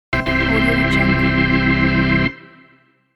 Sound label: tada organ